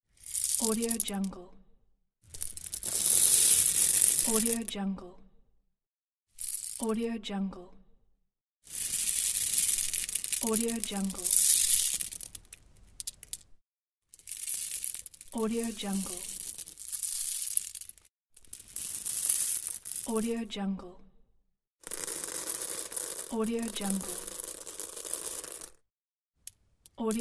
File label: coffee beans pour; domestic sounds